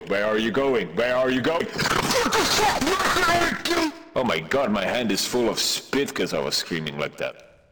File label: rage; torchlight; kroaat